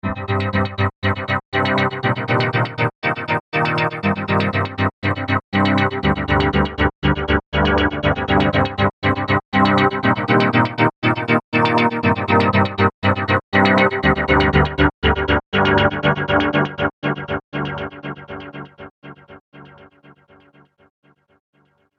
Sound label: Intensity Electro Synth Theme; Musical Sound Effects